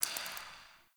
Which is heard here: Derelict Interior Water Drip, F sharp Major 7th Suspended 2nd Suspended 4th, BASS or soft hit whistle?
Derelict Interior Water Drip